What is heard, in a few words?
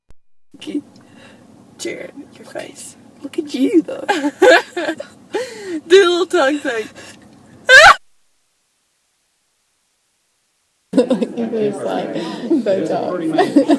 weird snap